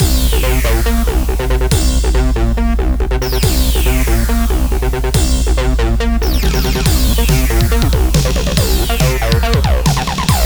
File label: Audio Chemical 140bpm